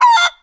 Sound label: Angry Chickens Underlay Death